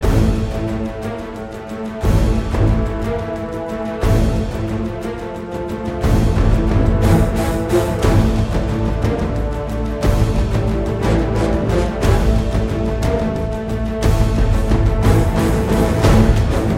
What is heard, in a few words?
Boss Battle; marvel contest of champions android ios gamerip 2014